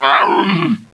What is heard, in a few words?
alert